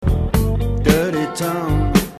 Nice Chord

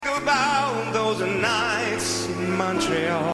Nice Chord

